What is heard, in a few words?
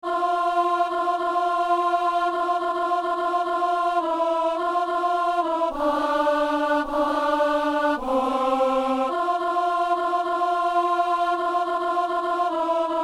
alto